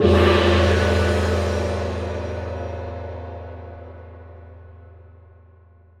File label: Crash, impact samples